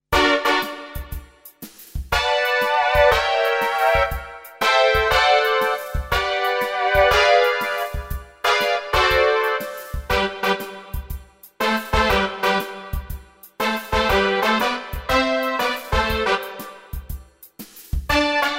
Brass Chords Breathing; mfx chain

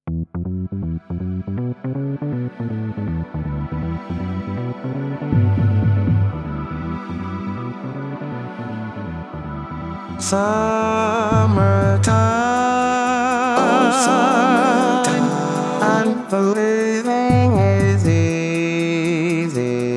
voice and background; vocaloid

